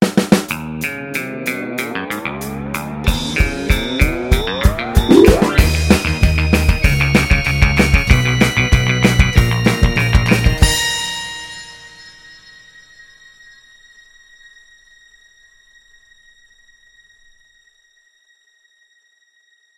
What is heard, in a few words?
Energy Dance